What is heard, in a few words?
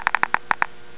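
geiger counter; ALARMS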